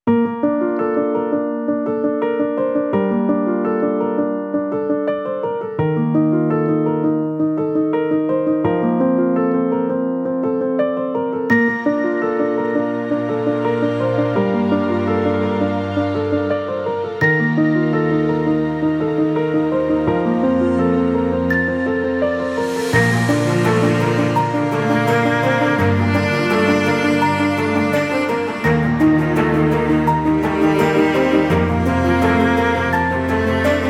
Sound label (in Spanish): musica